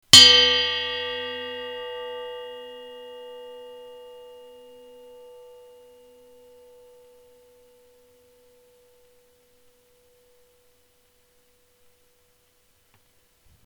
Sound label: hoop